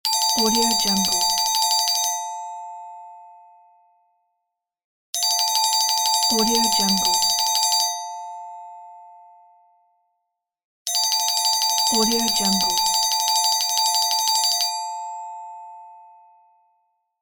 slot counting

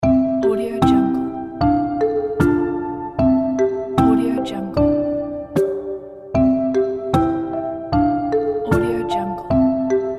cartoon kid

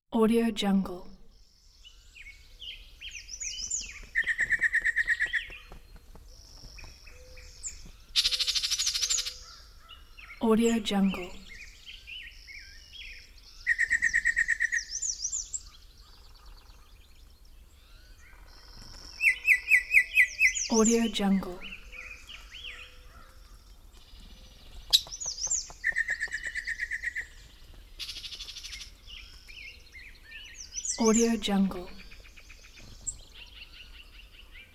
night forest; capeaudio

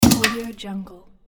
put metal bucket down

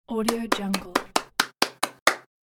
claps

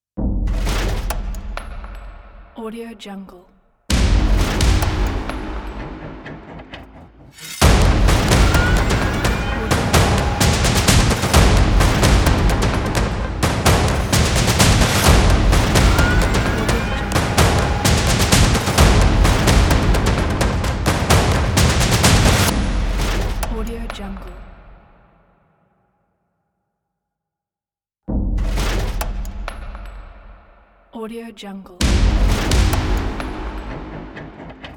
military stomp logo

